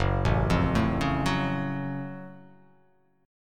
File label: F sharp Minor Major 7th F sharp